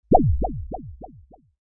Game spring bounce or jump

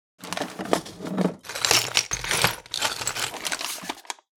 Fishing tackle box

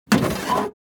Metal drawer opening

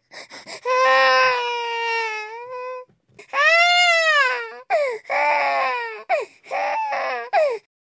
Baby crying hoarse breathing

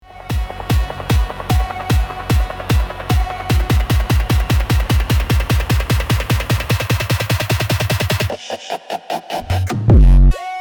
Extreme bass boosted

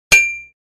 Metal impact thump

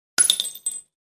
Shell casing fall on concrete

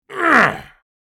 Furious old man grunt; scream

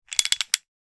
Metallic clinking rattling short

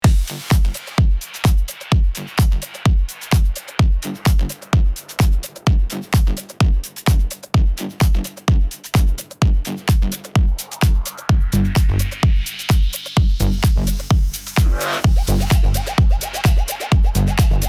Gaming action

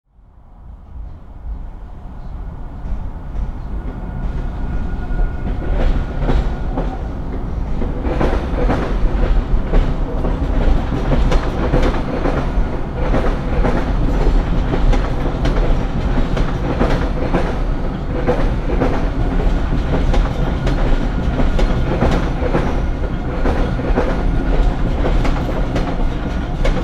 Heavy cargo train movement

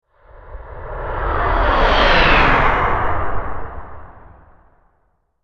Missile flyby deep sonic whoosh

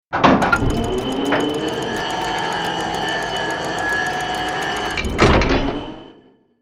Tank turret moving turning mechanical noise; artillery

